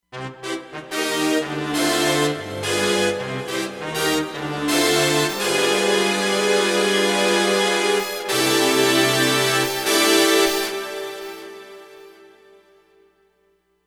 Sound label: Dynamic Brass